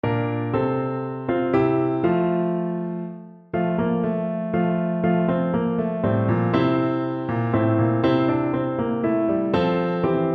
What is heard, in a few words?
ccockles, Bassoon